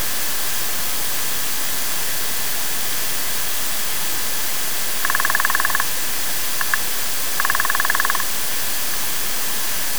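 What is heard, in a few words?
cordless phone receiver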